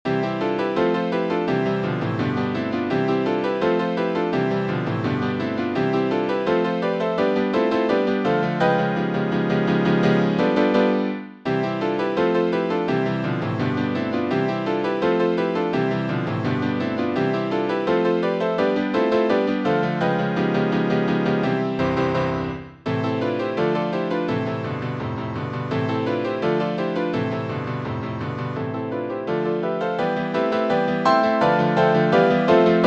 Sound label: Running Chords; Beguschie akkordy